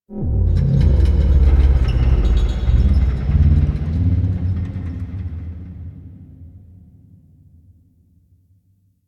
Ship Thrusters, Ambience; Warframe; Guild

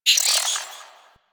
Landscape Cave Creature Calls, Ambience; Warframe; Venus Open World; One Offs

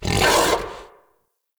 Battle Dog Attack Bark; Animals; Vocals

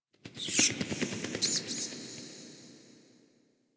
Interior Cave Bat; Warframe; Ambience; Duviri

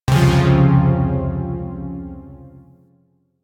Shawzin Phrygian Chord D; Zariman Shawzin

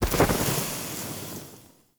Torch Puzzle Water Extinguish; Puzzles

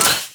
Shock Rifle Low Ammo Mech

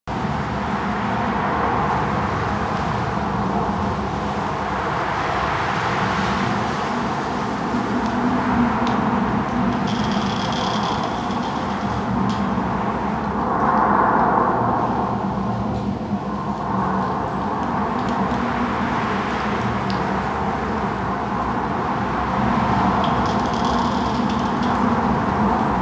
Forest Exterior Cave Open Top Amb; Warframe; Ambience; Grineer Forest

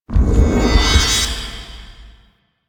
Fists Heavy Punch Charge

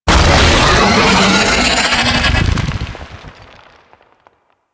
Burrow Idle; Ambience; Golden Maw; Warframe; The War Within

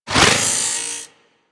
Worker Grinder Whoosh, weapons; Grn Worker Grinder

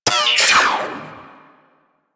Arch Cannon Mech, Weapons; Warframe; Archwing

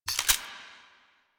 Refill Clip; Warframe; Duviri; Boon Powers

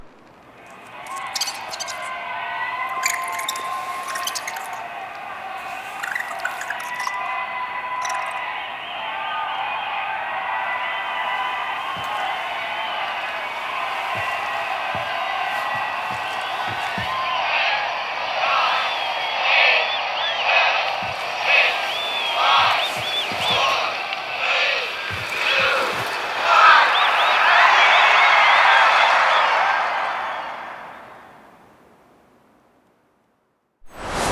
Kiss; Lettie; Warframe; Nineteen Ninety Nine; Quests; Romance NYE

